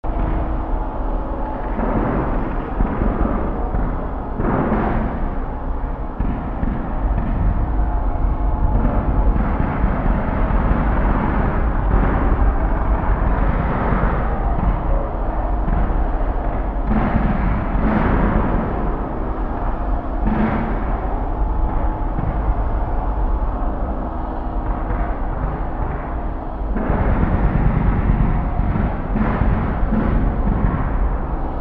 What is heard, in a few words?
Amb Battle Int